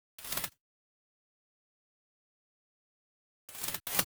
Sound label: In Flight Birds